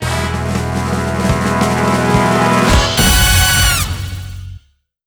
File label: coin Wheel Intro; netent